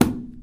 paintcan impact soft, metal; physics

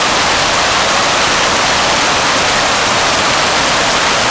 waterrain hard loop, rainscapes

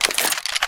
sniper military deploy; weapons

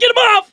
scream while pounced, mechanic, survivor; voice

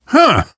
positive noise, survivor voice

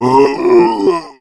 male zombie growl; boomer; attack

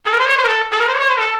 trumpet danger

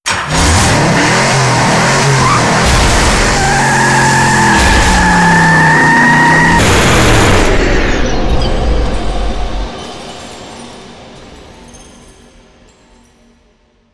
racecar exit

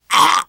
choke, teengirl; player; survivor; voice

